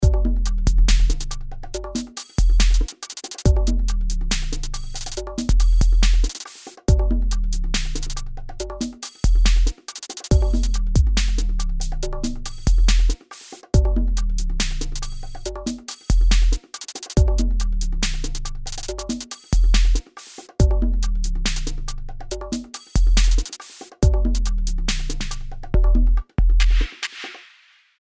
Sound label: drumgroup neutral; magnetismus 2